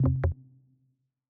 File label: error